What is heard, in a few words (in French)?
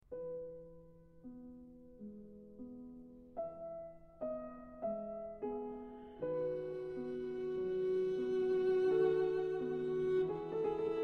Adagio cantabile Cello; 3 mouvement; Beethoven Cello Sonatas; Glossaire termes sons; Cartable musical